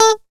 PICK A4

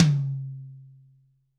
AMBTOM, DRY+AMB TOM

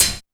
HH, HIHAT HIP, Dance Mega Drums; Best Service

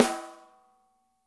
DRY, snares; Spectrasonics - Burning Grooves CD2

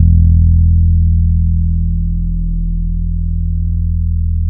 E 2, hood boom, bass; Spectrasonics; Bass Legends CD 1

